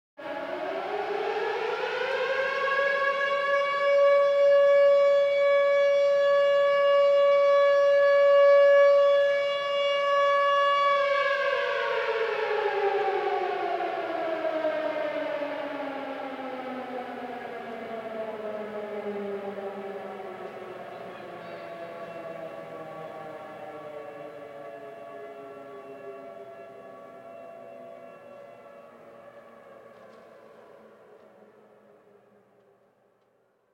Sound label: Sirena 5 1